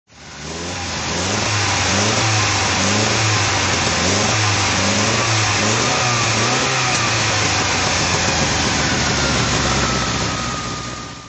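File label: lotus esprit throttle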